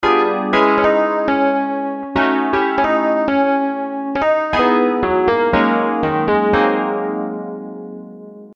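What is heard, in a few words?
REHARM with MELODY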